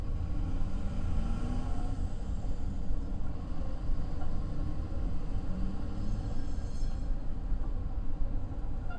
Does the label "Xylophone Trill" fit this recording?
No